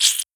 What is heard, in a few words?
blood Short